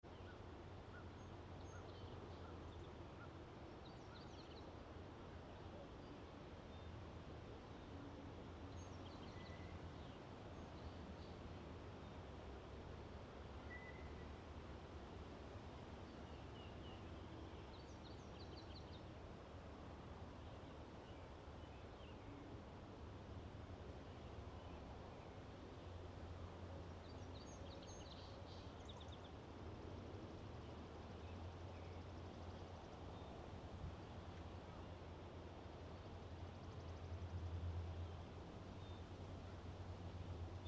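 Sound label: Room Tone Air Exterior Light Birds Distant Road; Southern Ontario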